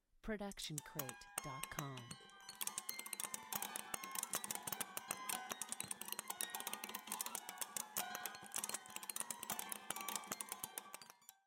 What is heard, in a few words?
horror warped music box; soundscrate